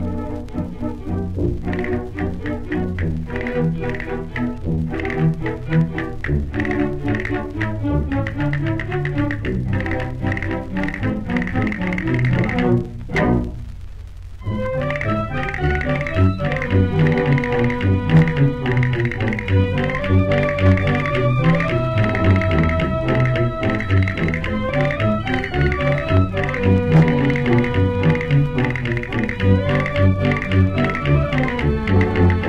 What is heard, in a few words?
fandanguillo de almeria